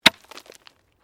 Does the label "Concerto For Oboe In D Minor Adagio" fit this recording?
No